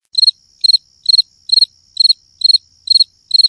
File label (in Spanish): silencio grillo